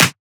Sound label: clap oneshot; GhostHack